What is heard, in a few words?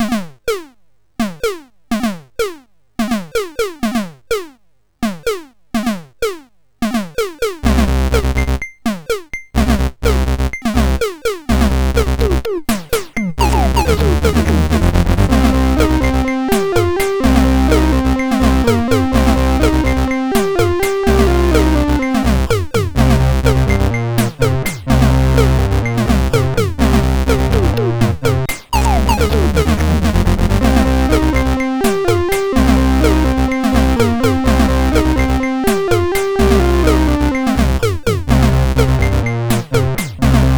Beat Box MOS6581R4; sid